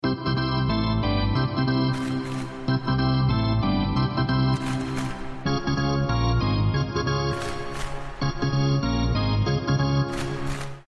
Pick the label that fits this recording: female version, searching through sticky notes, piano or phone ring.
piano